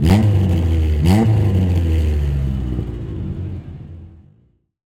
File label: sport car revving; fvtt sonniss gdc audio